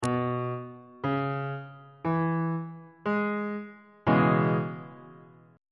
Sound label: d flat minor 7th 3rd inversion on bass clef